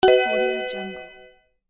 well done

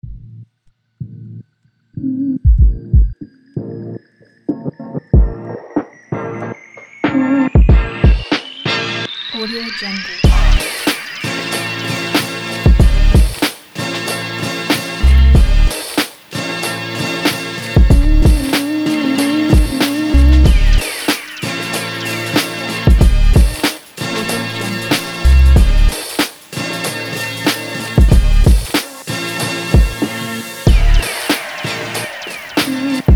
boom bap beat

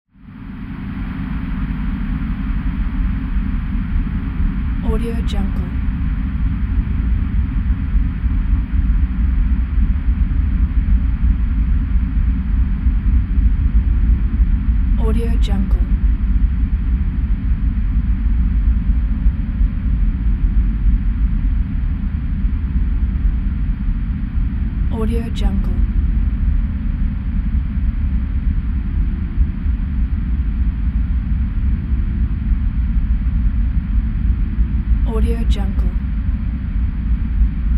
spaceship engine drone

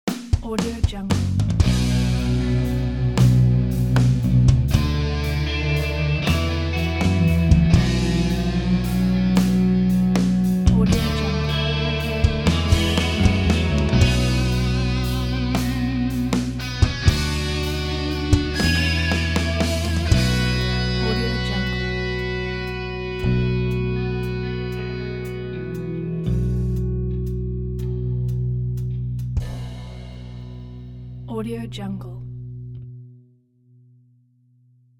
straight rock bumper

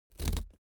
object box cardboard big rip open

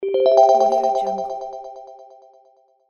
soft notification

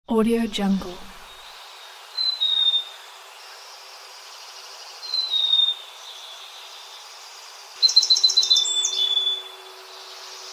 forest bird; gungomer

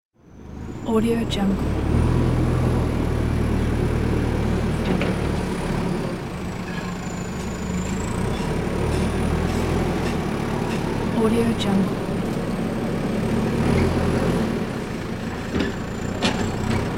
bulldozer working

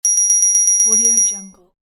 multiple fast dings; applehillstudios

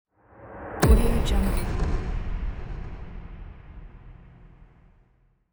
mutted metal explosion

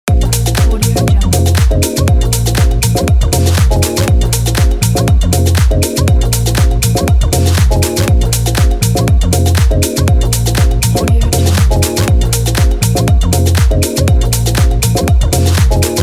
fashion loop

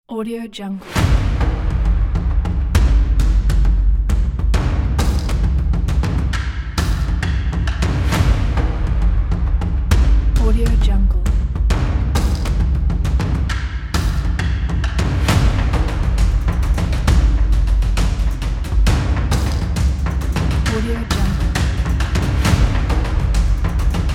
cinematic drums

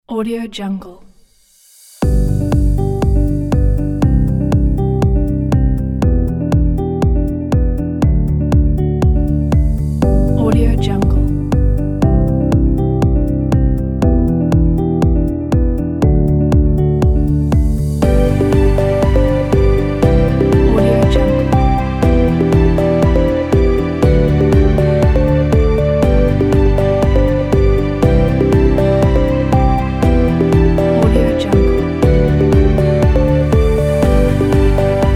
uplifing and inspirational corporate

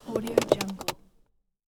squeaky car seat

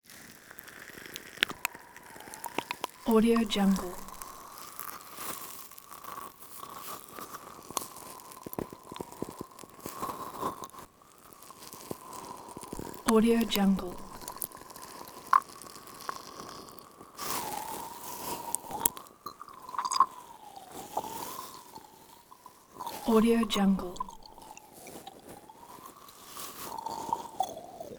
tongue slither slimy slurpy wriggle

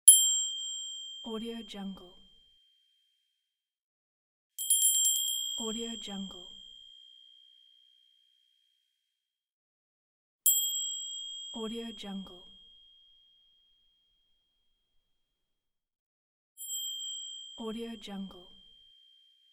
crystal bell

